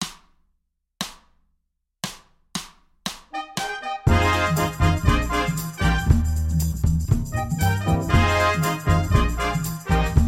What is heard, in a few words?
118 bpm; allspelslåt